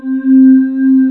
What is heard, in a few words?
bow glass; cubic